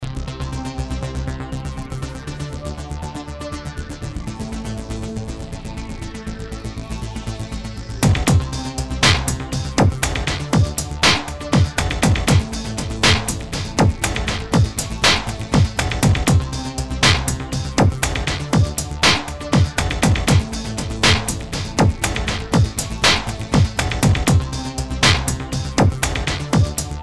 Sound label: Techno Dream